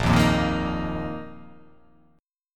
A sharp Suspended 4th; strummed; A#sus4; JGuitar

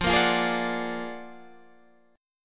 Gb Augmented Major 9th, Guitar

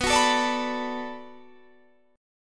B Minor 7th B, chord; Bm7 strummed

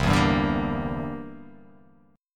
C 9th C down; C9 strummed

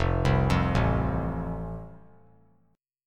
F Major, F Chord; arpeggiated

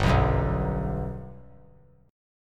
G Major 7th, G, down; strummed; JGuitar

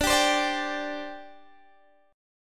D 7th Suspended 4th, down, Guitar, Drop D

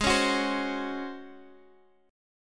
C sharp Major 7th Suspended 4th G sharp

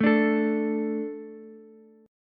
D 5th D, down, Ukulele; D5 strummed; JGuitar

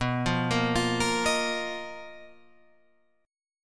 B Major 7th Flat 5th B down Guitar Drop D

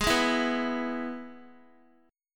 Ab Minor Sharp 5th Ab down Guitar; Abm#5; strummed

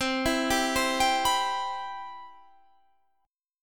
C Major 7th, Guitar Standard; CM7 arpeggiated; JGuitar

